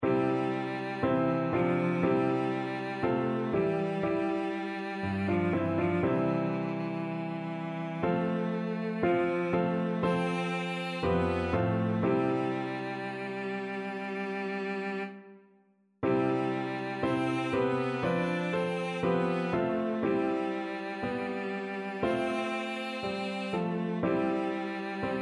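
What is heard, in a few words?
violoncello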